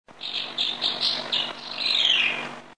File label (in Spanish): voz golondrina parda